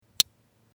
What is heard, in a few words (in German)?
Taschenmesser